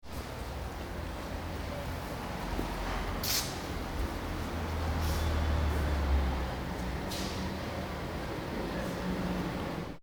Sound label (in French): sol urbain pas glisses